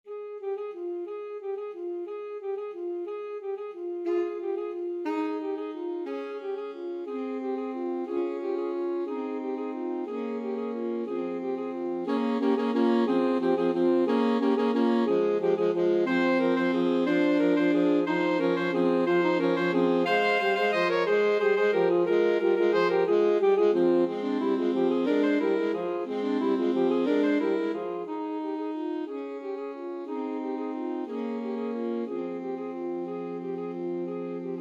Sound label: carol of the bells alto sax trio